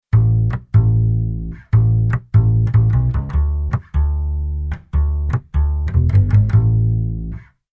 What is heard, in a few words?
Bass Arr Rock n Roll Bass